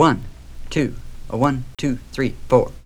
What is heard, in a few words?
Vocal, Trip-Hop Loops; Sonic Foundry (Sony Creative Software); Rondo Brothers Trip-Hop Loops & Samples; Hard Rocks The Roof